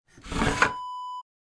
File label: sauna vent open